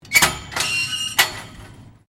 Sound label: GATE SUPERMARKET AISLE GATE OPEN STEREO